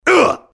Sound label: mobster pain sharp